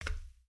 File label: Foot Steel Boot Walk; footsteps